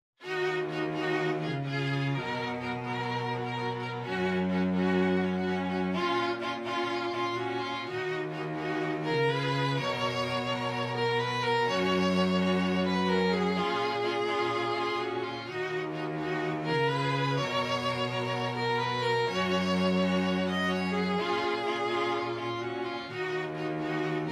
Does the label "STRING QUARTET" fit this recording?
Yes